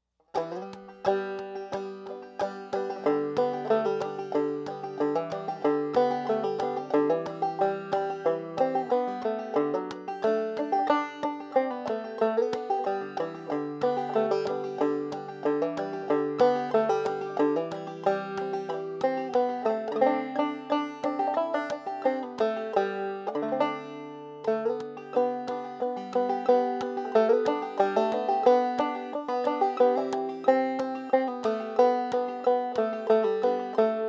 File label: jingle fast, banjo; Christmas